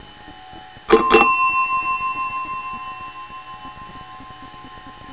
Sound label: desk bell